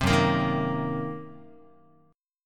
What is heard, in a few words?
Gb 6th Flat 5th Gb down Guitar; strummed; Gb6b5; JGuitar